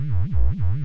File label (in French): ovni